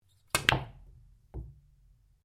Billiards Cue Cushion Cushion, Home billiards table; Pool; Sports